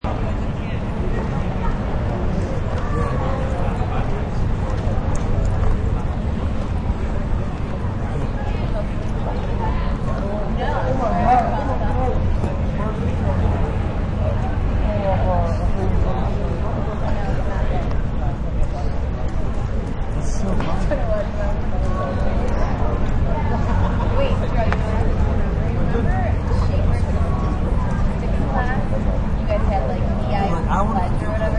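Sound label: College Campus Outside, University outside ambience.; Backgrounds; Schools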